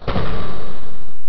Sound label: DOOR OPEN